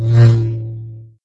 Lightsaber; Oggy

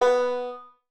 Banjo Note; Bendy: Lone Wolf

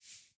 Hand Move

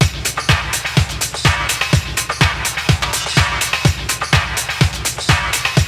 Result A Jingle

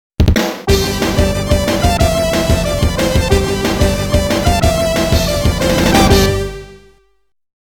Mission Clear; Mission 1 Clear; TAITO SOUND BULLETS (2025)

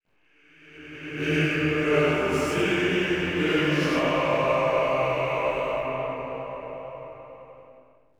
Door; Forgotten Realms Demon Stone; PS2

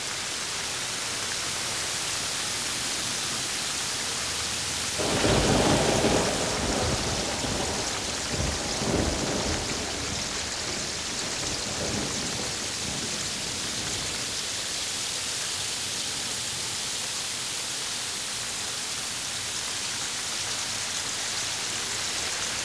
Rain Ambience

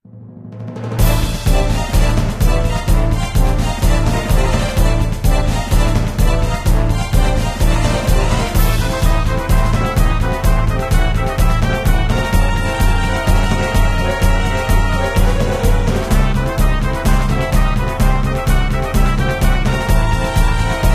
enemy s territory

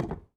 Crate Impact

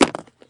Impact Plastic

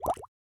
Bubble

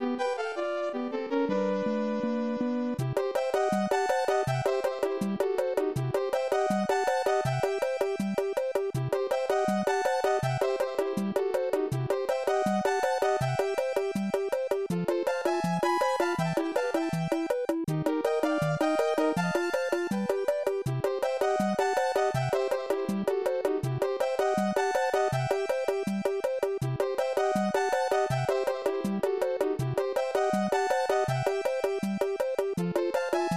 Hurry Up! Underwater BGM; SMB3; Super Mario All-Stars; SNES

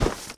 Backpack

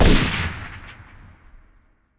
Harpoon Shoot

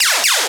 Fx Laser Aim; bass house

